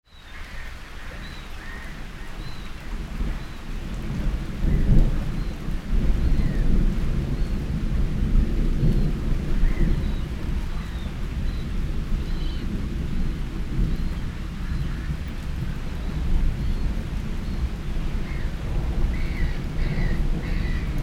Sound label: thunderstorm over a lake